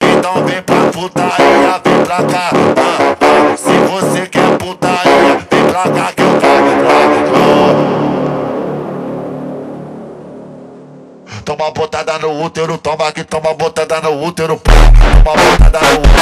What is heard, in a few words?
phonk montagem